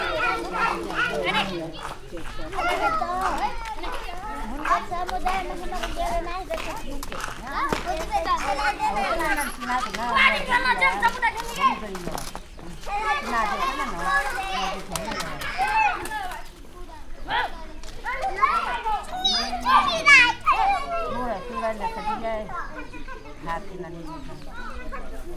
falusi gyerekek hangulat, nemzeti park

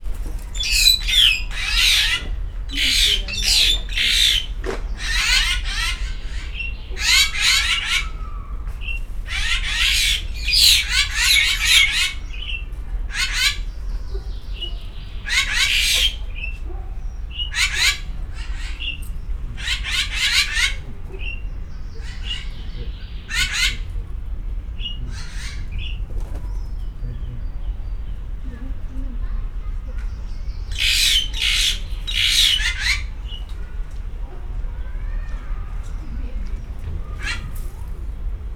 csivitelő madarak, egyszínű királycsőricse; szegedi zoo 2011

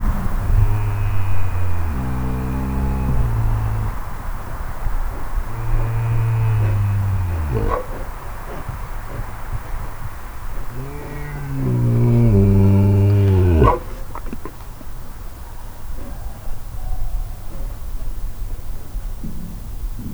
beazonosítatlan, eurázsia, szélvédő; állathangok; nyíregyházi zoo 2010

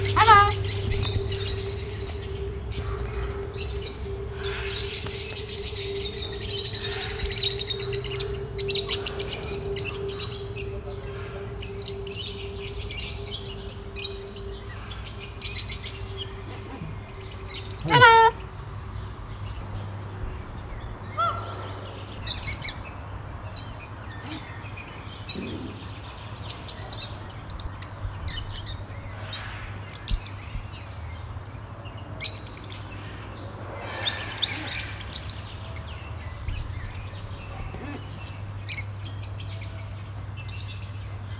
mas hangot is hallat, sarga bobitas kakadu; állathangok; nyíregyházi zoo 2010

